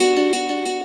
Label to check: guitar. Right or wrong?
Right